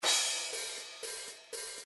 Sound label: rock cymbals 1 bar